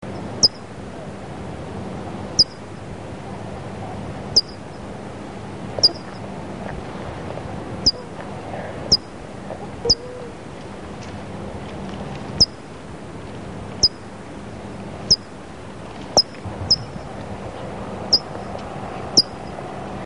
California Towhee

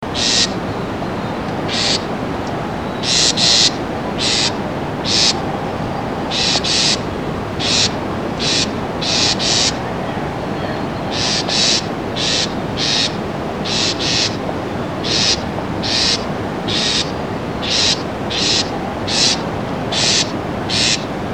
House Wren

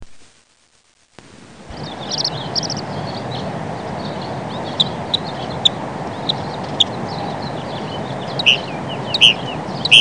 Northern Mockingbird

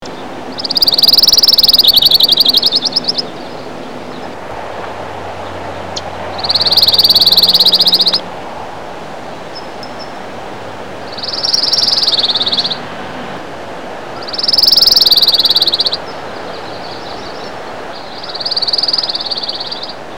Pine Warbler

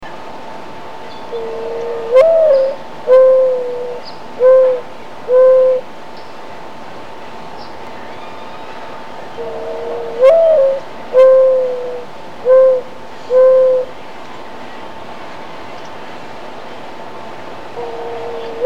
Mourning Dove

